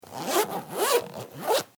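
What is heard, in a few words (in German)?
Etui Reißverschluss schnell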